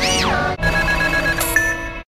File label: points